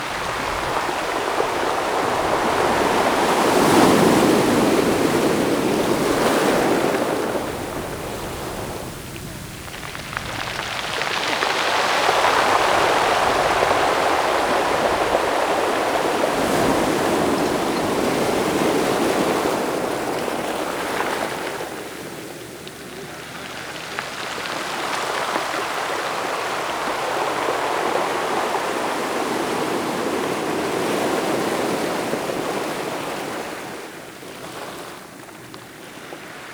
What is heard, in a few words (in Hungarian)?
morajló víz görgő kövek